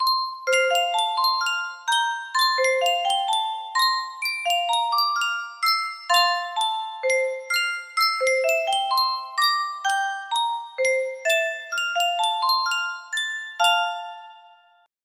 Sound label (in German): yunsheng spieluhr